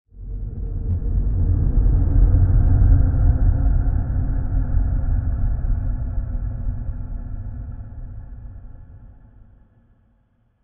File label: Dark Winds; Evil Sound Effects; horror sound effect